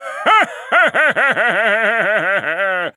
Wizard Laugh

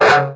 Smash

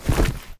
Chair Racer Landing

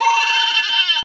Wizard Mini Laugh

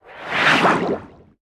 Snorkel rise

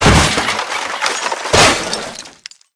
Chair Racer Crash

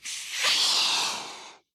Balloon inflate

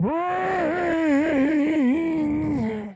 grunt

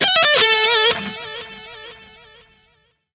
Guitar

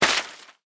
paper rip

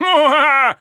Wizard Laugh

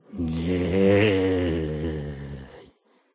Beach Groan

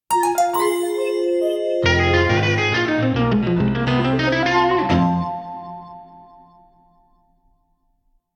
reward

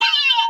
Staff Slam Hit

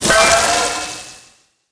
Wizard transformed

